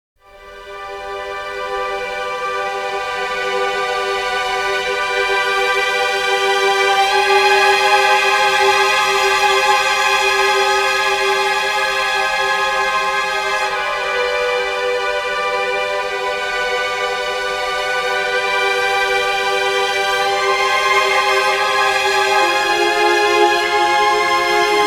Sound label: cassette; Lyra